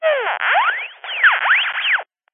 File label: cb radio walkie talkie short static burst glitch